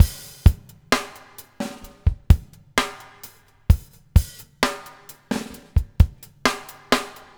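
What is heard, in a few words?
BUBBLE left; Spectrasonics - Backbeat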